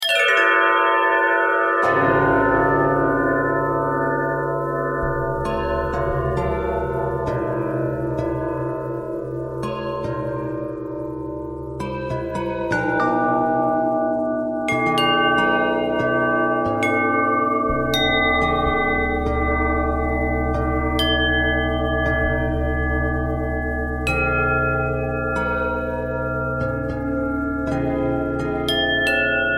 bell twist

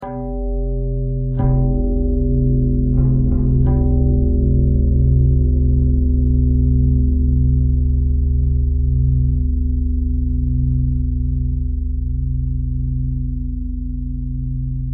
bell bell thread simple, bells

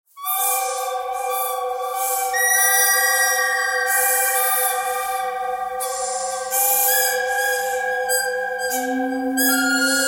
bird cry bird canyon thread pentatonic

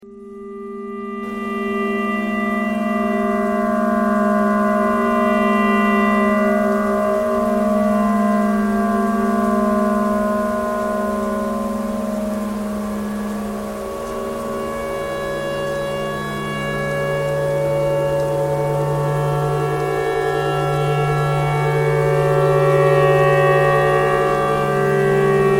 tornado siren clarinet thread noise

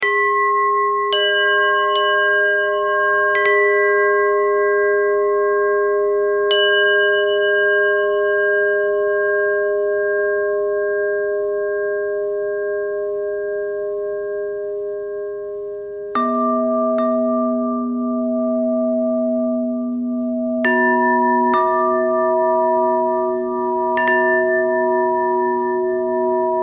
bell bell thread noise

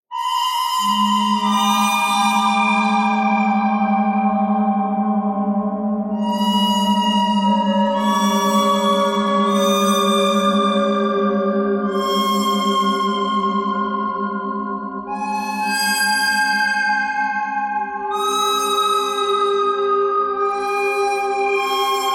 bird thread harmonic

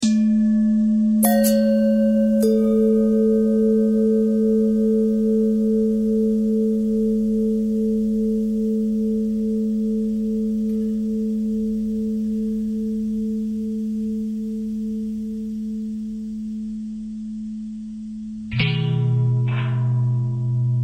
longbell bell bendthread; bells

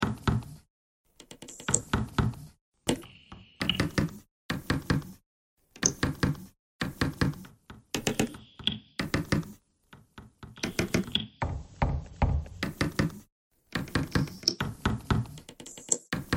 bagpipe thread noise

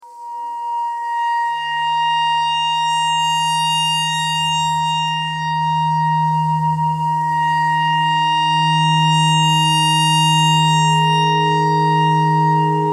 clarinet notes e clarinet notes e pentatonic

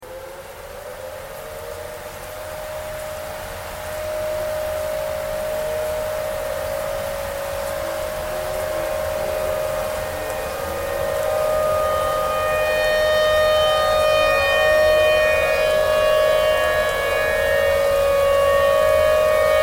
tornado siren tornado siren thread noise

